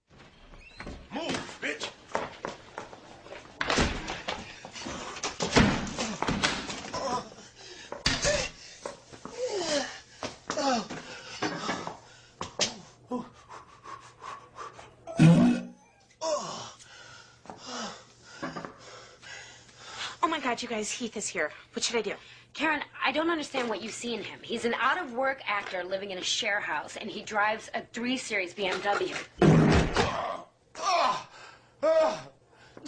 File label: toilet scene; white chicks